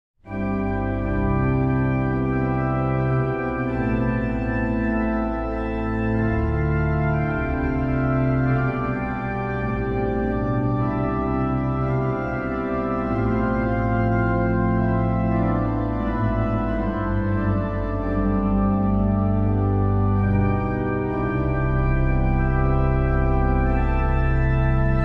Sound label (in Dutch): orgelsolo